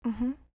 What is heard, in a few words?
u hmm